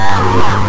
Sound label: FX REVERSE